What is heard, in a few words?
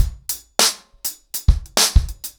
100BPM, ACOUSTIC DRUMS